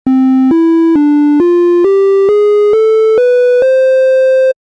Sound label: sankaku wave